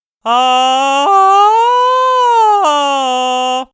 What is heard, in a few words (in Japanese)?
地声と裏声をつなげる（失敗例）